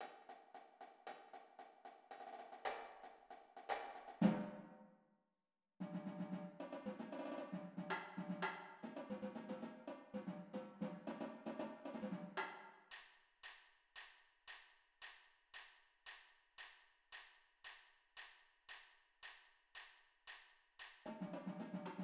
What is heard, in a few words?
cadence tenors, drumline